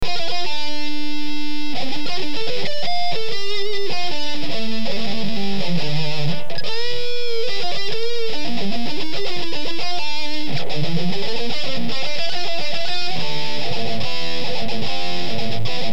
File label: solo